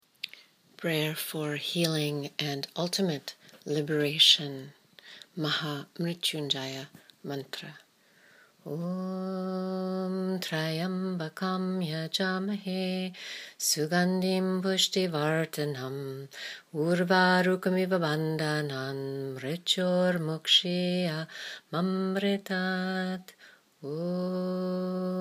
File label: Mahamyritunjaya Mantra